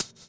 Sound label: Hhat, HiHat; Equipped Music; Loungin House